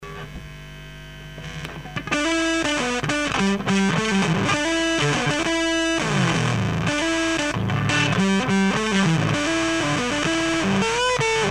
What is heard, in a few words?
Axis Fuzz Max Gain; фузы; Roger Mayer